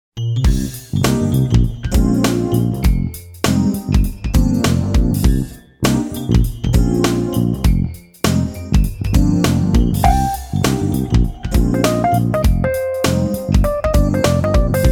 Cool Jazz; Soundzabound Volume 4

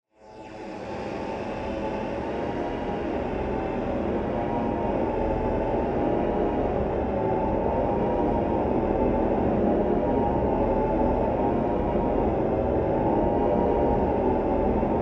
ghost moan

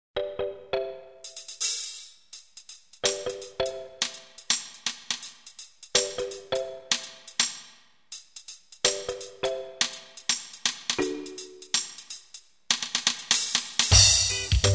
A Little Reggae; Soundzabound Volume 1

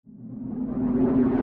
Ascend Wind

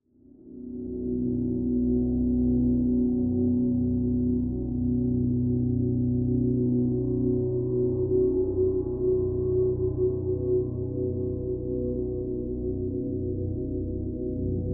Eerie Drone

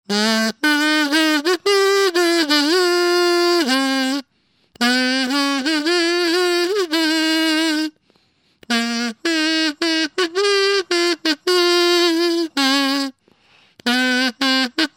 Kidz Kazoo; Soundzabound Volume 3 Disc 2

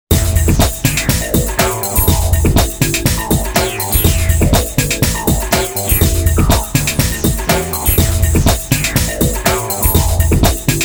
Grooveloop; Soundzabound

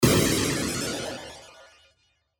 Burst Warble

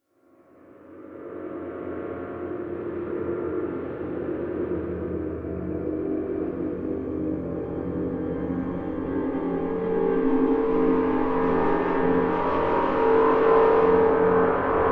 Eerie Tonal

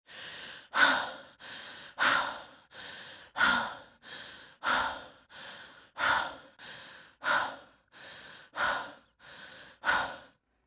Breathe Heavy

